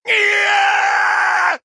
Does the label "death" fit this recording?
Yes